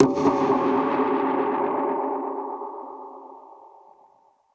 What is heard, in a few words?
Perc Hit, dub percussion